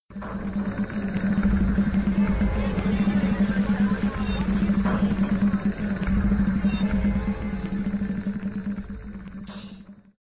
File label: Tense Sounds